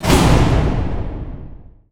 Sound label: impact mech; Hits, Impacts